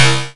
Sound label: Percussion; Vengeance Samples; VEH2